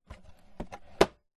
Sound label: household lawnmower electric cordless plastic battery cover open close; zapsplat